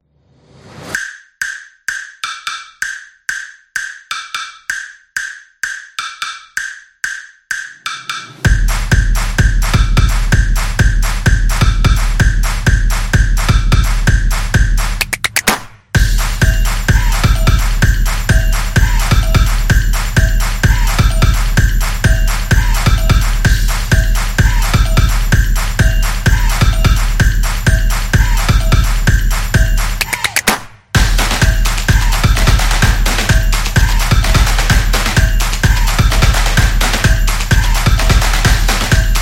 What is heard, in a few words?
Fun Music; stomps and claps